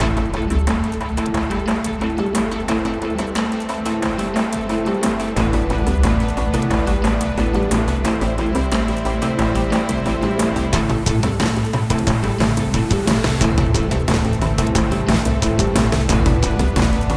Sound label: Battle Loop; bakugan champions of vestroia 2020; switch gamerip